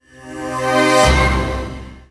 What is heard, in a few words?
Sweeps Short